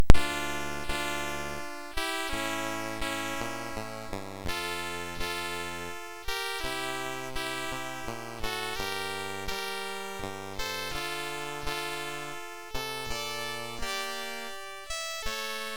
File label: Sarabande, sid MOS6581R3